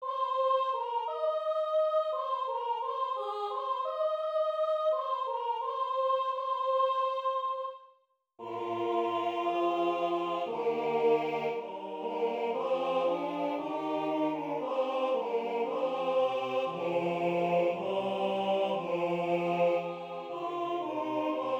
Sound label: Haec Dies Tenor dominant